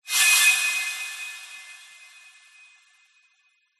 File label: horror metallic stab jumpscare